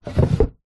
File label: household wooden cabinet drawer open